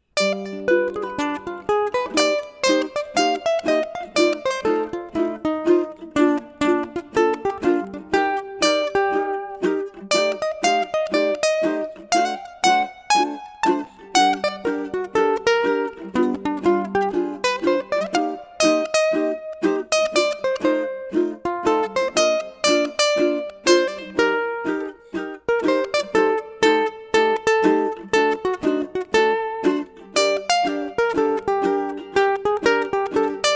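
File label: ukulele solo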